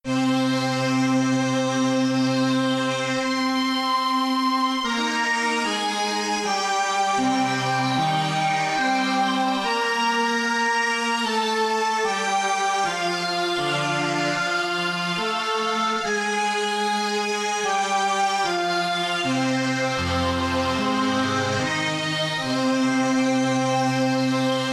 strings phaser reverb space

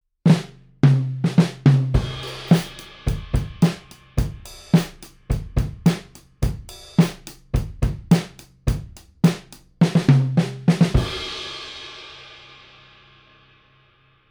Extinct Audio BM9 Stereo Overhead Sub Kick

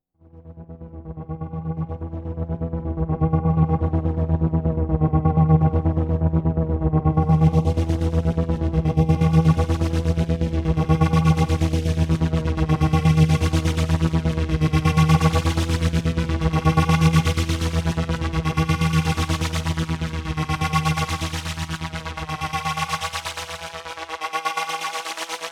Wampler Terraform Malekko Manther Rotary

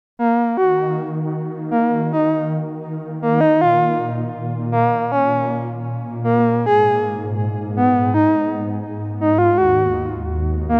synthex soft

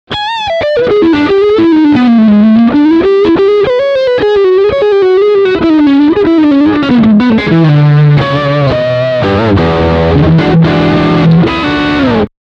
Lick Front Singlecoil

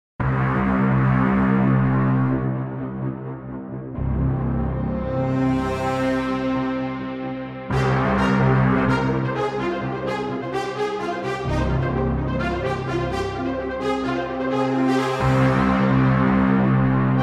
Brass Loop Designer Straight Performance Combo

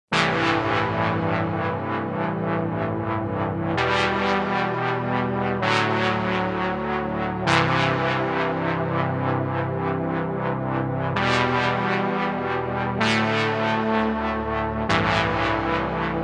JP08 LFO Brass

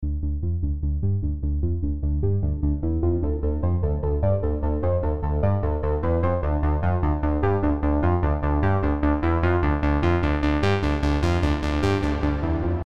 OBXa V sequenz automated

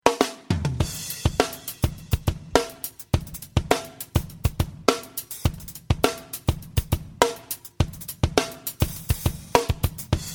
Germanium Bias High

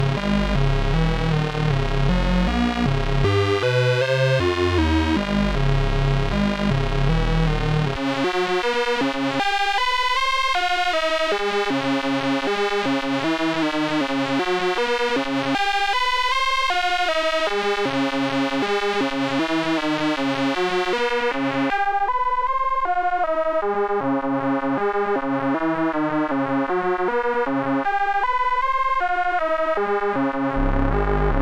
Stylophone S2 Ring Mid 3 Modi; Dubreq

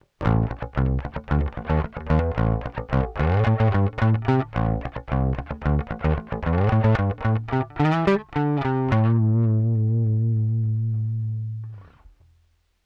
Clean EQ Drive50 Blend50 HF

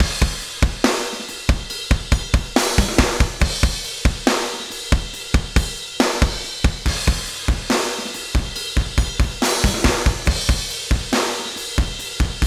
DRUMS crazy transient glue DRY WET

